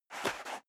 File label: step high heels sand scuff; schritte